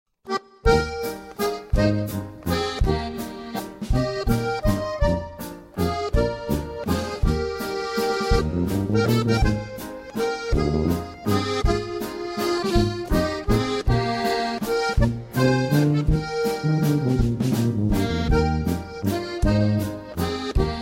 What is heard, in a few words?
Waltz